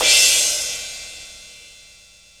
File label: RIDE, Drum Dry; East Collexion; Drum 1 Dry